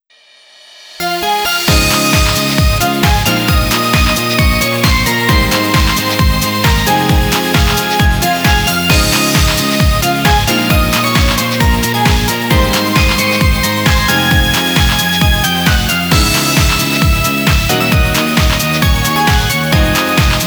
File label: Bouncy Disco version